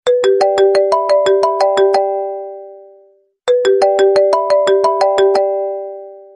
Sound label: Iphone Ringtone